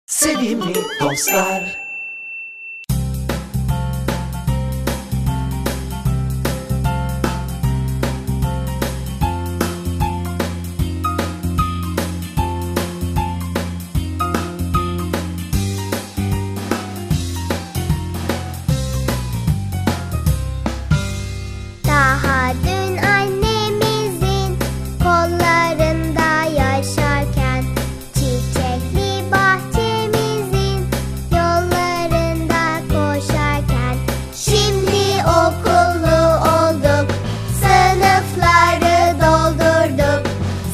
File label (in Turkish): Cocuk Sarkilari